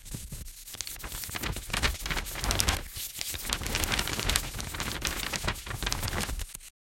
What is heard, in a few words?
continuous paper flipbook, flipping